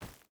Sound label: footstep barefoot forest run; Rust